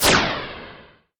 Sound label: Flare Deploy